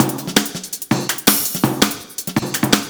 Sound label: Sonic Break; Jungle; Breaks; Blu Mar Ten - Jungle Jungle - 1989 to 1999 Samplepack